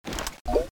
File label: draw; csco; csgo; weapons; bloodhunter